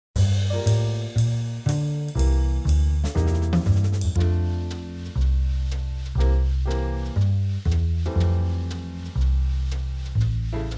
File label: 120, Backing tracks